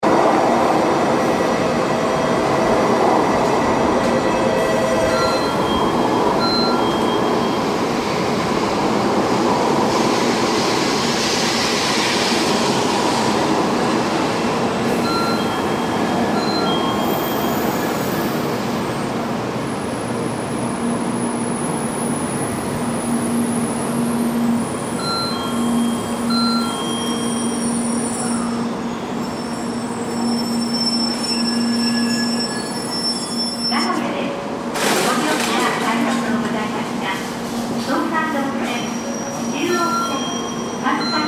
Shinkansen Express Train Station, Train station ambience; Japan; Shinkansen (high speed Japanese train network); Train Sound Effects; Lynne Music